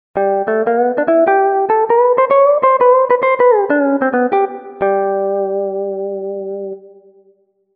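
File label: Steel Guitar; TURBO VL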